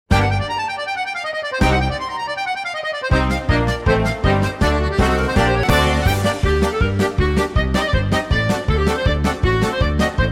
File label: Hora Instrumental